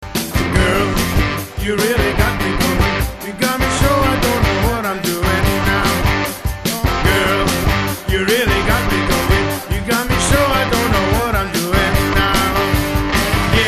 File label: Guitar